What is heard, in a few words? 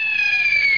throw